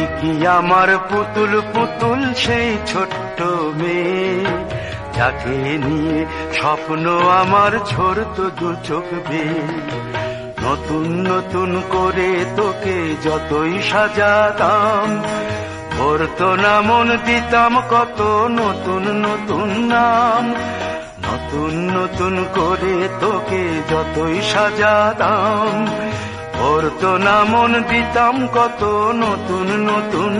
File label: RINGTONE, BENGALI